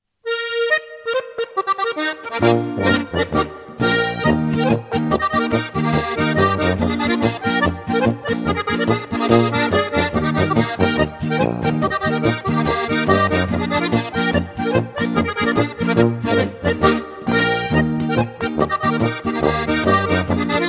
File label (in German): Oktoberfest Polka